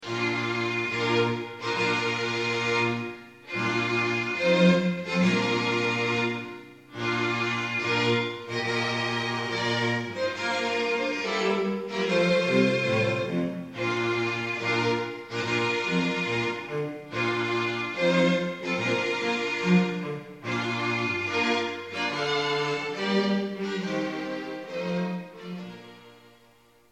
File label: strings; wagner